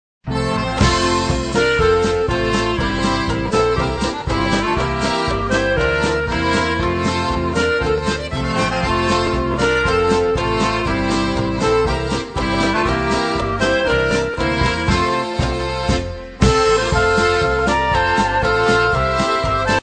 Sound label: POLKA